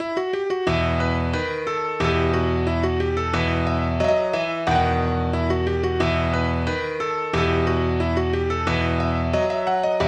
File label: Rameau Tambourin No 8